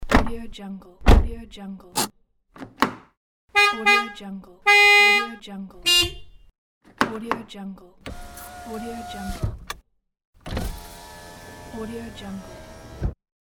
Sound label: car sound pack; mrsoundfx